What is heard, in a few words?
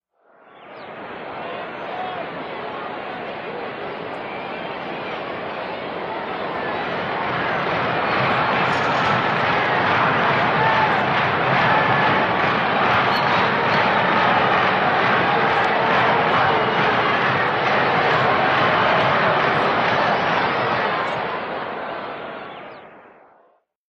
Huge concert crowd, general ambiance